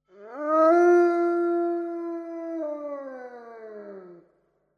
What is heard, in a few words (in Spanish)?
aullido; efectos especiales